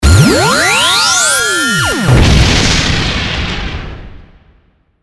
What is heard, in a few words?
magic SE sample